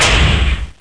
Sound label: bash it; Total Chaos Nukem 3d Pack; duke